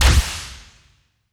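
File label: plasma weapon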